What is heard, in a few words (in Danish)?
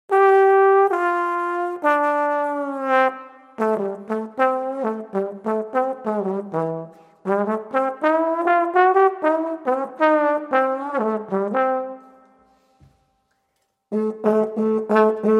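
trækbasun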